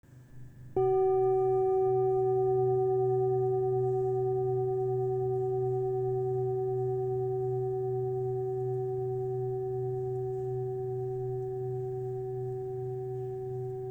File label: F 379Hz